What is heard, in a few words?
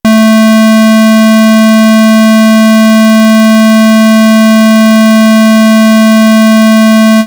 waveforms z1 pulse